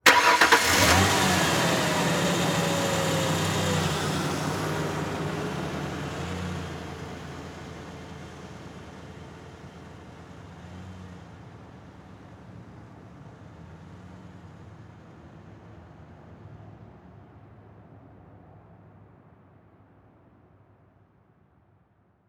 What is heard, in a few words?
Truck Ford Ranger Start Drive Off Slowly; transportation